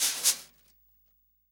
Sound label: snare drum A, SWING SNARE CHROMATIC, REAL DRUMS